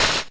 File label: Fell Stinger; pokemon reborn e19 2022